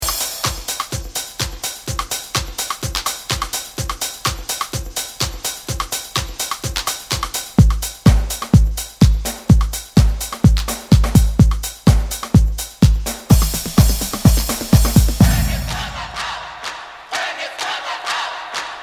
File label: Acid Disco